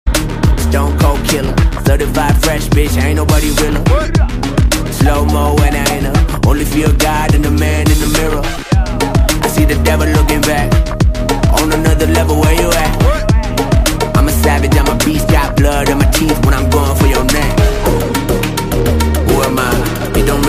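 trending background music ringtone malayalam mass background music; fahadhfaasil; aavesham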